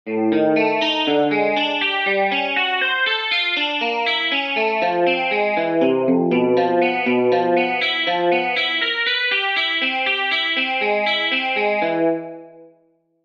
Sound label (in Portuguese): Exercícios Guitarra 120 bpm